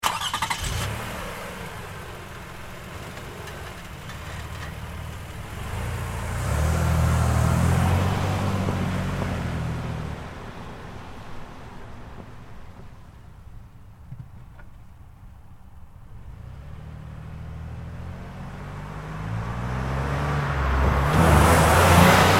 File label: Car Highlander 3PT Moves; 2005 Toyota Highlander V6; 3 Point Turns; Vehicles; BigRoomSound